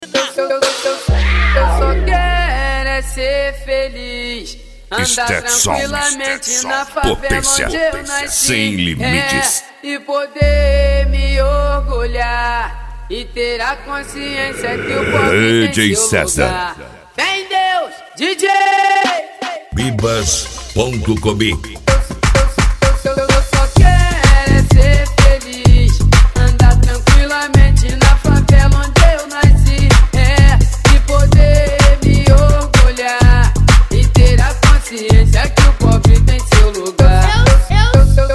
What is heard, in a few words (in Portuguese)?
sound car carnaval